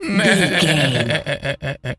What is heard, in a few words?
goat baa calm